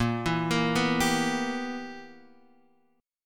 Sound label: B Major 7th Suspended 4th Sharp 5th Bb